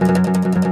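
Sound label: OUD tremolo, Plucked Strings; E-MU Producer Series Vol. 7 – Old World Instruments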